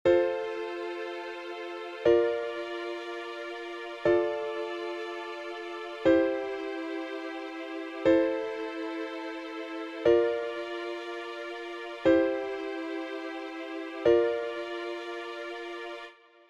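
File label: F Major Chord nice progression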